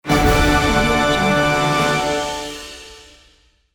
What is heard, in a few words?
tada fanfare